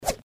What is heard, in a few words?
bat